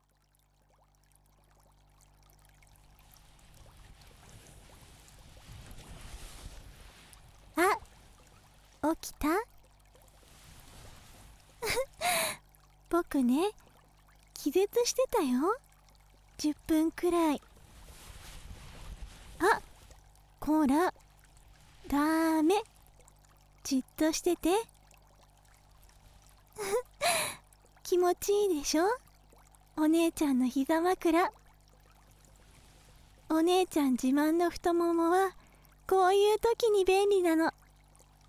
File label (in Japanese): 耳かき, ASMR; ご褒美に耳かきしてあげる